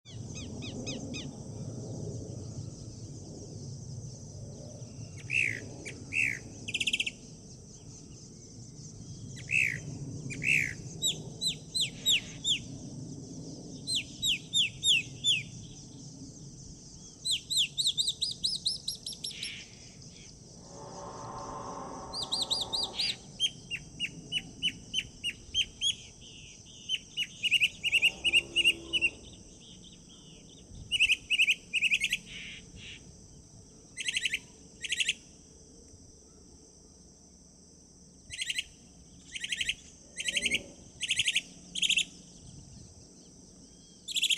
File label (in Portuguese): Canto do Sabiá do Campo